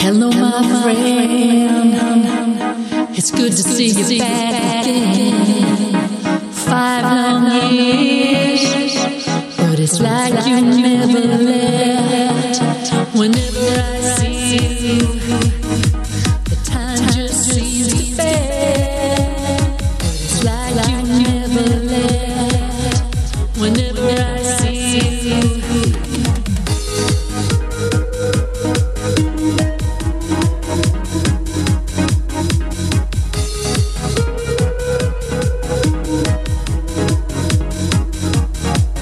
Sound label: Disco Chill Vibes